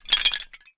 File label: SFX Foley Jingle Short; gamerip; Hellpoint